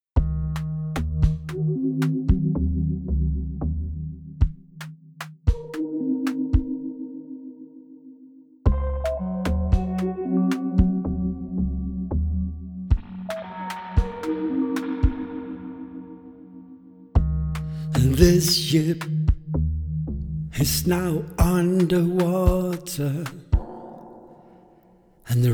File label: karaoke